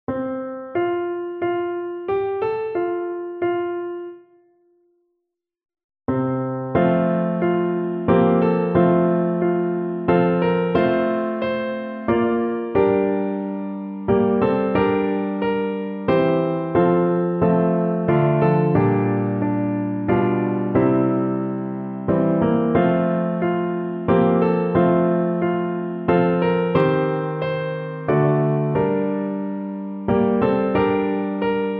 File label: away in a manger backing; choir at bishop ellis